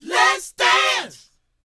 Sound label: LET, Vocal, R&B Dance, DANCE 120